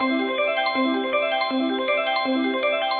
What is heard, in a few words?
Rollup loop in C; crystal Gems; Core Gaming